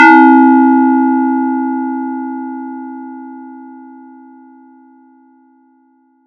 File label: FX RND BEL; ModelCycles